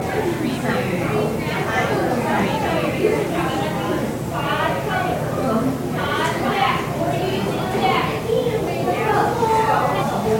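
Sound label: Elementary School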